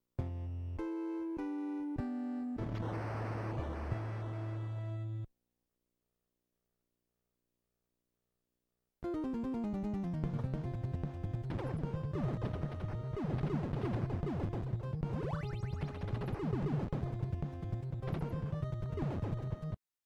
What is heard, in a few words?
Contra game NES; Zomboid; Video Game Consoles